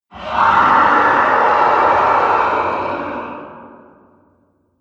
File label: Dragon In Underground Cave, monster